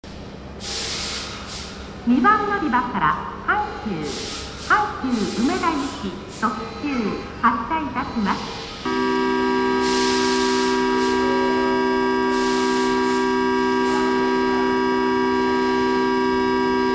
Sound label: bell, station